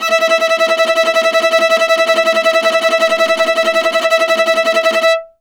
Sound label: VIOLA TR E4A, Viola II Tremelo; Orchestral; DirectWave; Varazdin Orchestral